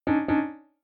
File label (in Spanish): fallo Suave